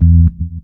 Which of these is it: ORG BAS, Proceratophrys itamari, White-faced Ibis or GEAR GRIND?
ORG BAS